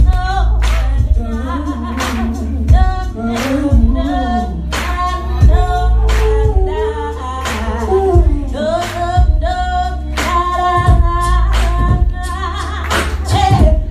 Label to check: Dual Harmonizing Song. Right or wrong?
Right